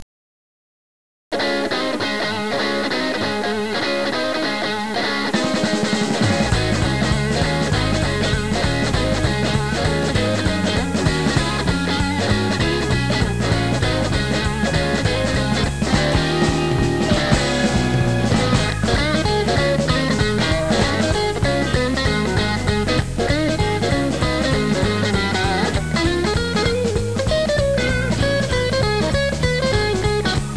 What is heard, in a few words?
Shuffle